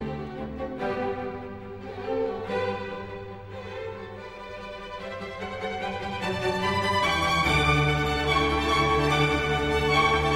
serenade nr 13 g dur kv 525 eine kleine nachtmusik allegro